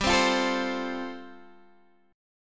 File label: G 7th Suspended 2nd Suspended 4th, G, Guitar, Open G; down